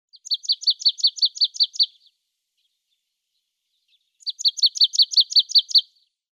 Sumpfmeise Parus palustris; Vogelstimmen

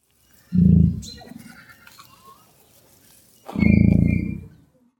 Emu Dromaius novaehollandiae; Vogelstimmen

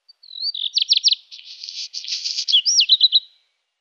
Hausrotschwanz Phoenicurus ochruros; Vogelstimmen

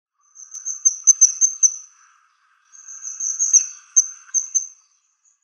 Haussegler Apus affinis; Vogelstimmen

